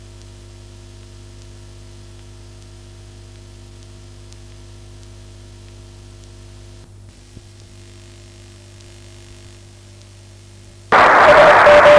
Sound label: Beacon